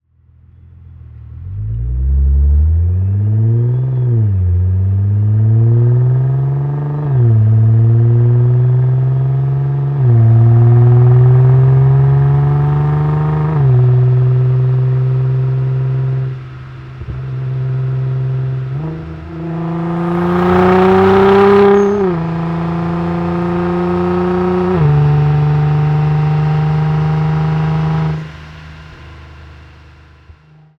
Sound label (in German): Sound, auspuff